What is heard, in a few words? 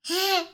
heh